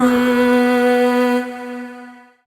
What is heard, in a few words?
Void Shawzin Oct One B; Warframe; Zariman